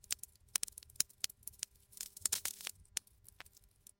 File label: kindling crackle close